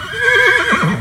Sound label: Horse